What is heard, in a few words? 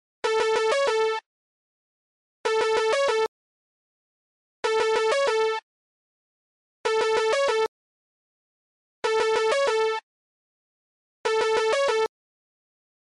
jingle Nokia ringtone